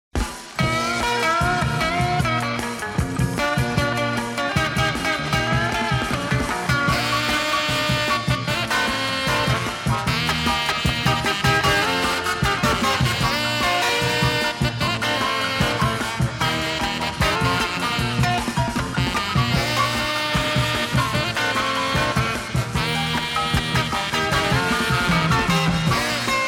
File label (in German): orchester beat